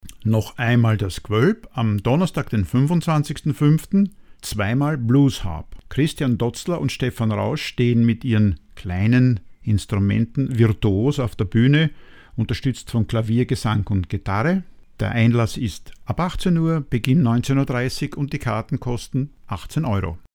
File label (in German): 2x Bluesharp